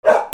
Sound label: DOGS